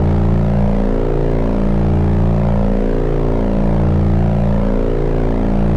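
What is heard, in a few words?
engine loop, spacecraft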